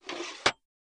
Tripod Leg Telescop

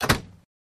Door Close Lightly Y

